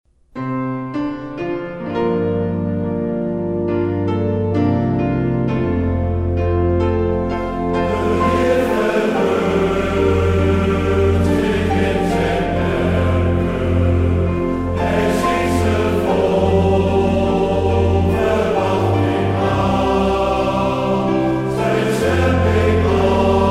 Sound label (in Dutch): TTBB